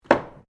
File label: ORGAN PUMP STOPPER OUT, Old portable church organ; Keyboards; Musical